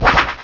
Wing Attack; pokerogue

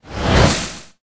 Wind; pokerogue

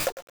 bubble; pokerogue

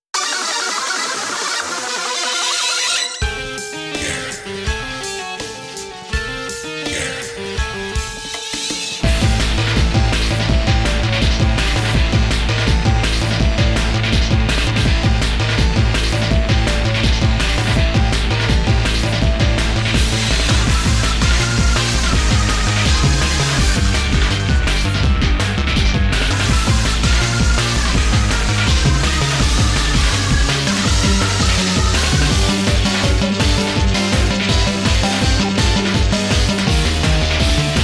battle skull grunt; pokerogue

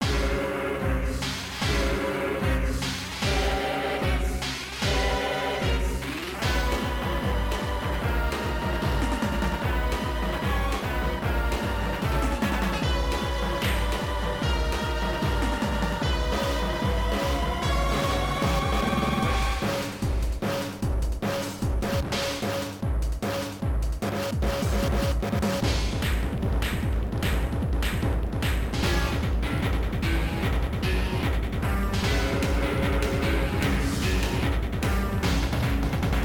battle boss; plasma; pokerogue

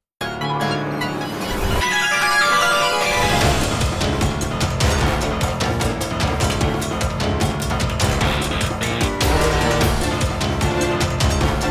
battle aether grunt; pokerogue

